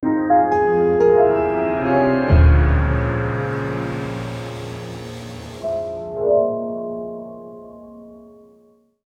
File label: fairy tale transition